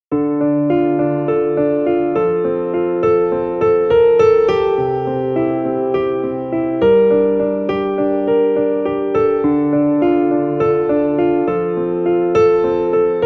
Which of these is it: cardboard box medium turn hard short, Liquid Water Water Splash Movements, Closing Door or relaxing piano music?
relaxing piano music